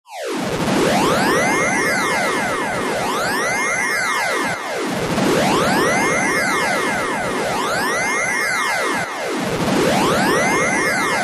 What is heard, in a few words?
sound design fm pulsating delayed sci fi possibly alarm; zapsplat